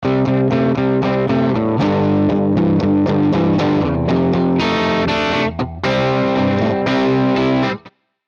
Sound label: Elec Gtr Pultec EQ